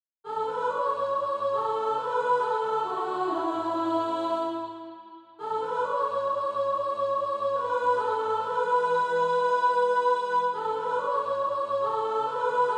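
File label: soprano, Practise Music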